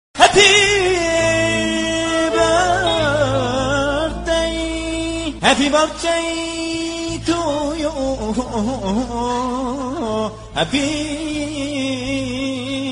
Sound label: Happy Birthday Kids Remix Lyrics